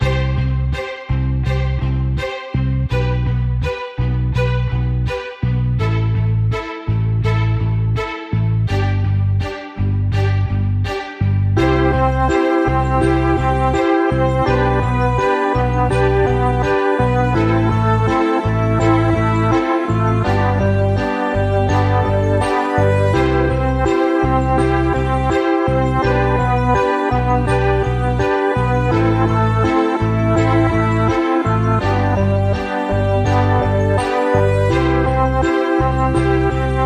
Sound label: No Drums No Melody